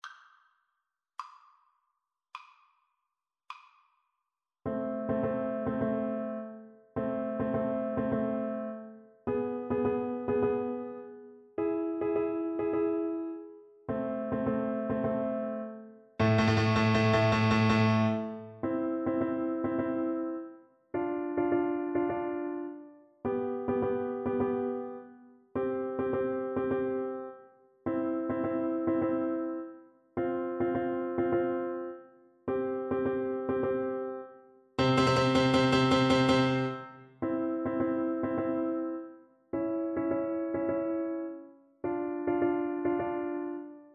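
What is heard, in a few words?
recorder karaoke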